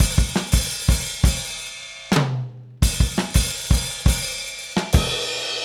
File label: Drums, 70s Analog Funk